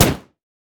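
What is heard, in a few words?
turret basic attack; LoL; heimerdinger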